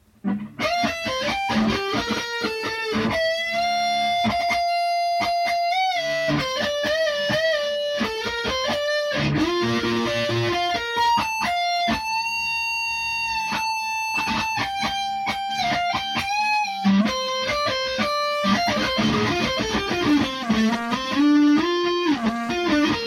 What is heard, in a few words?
syn solo